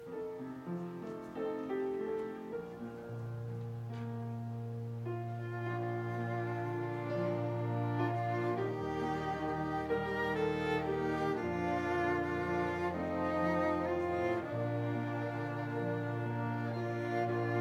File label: instrumental